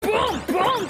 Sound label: Boom Boom; Tanjiro